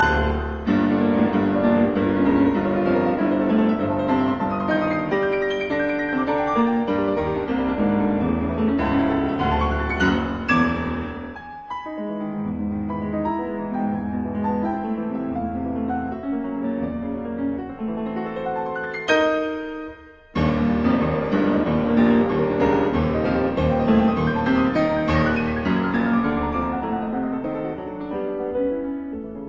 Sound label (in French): Prelude et etude en arpeges Etude; Busoni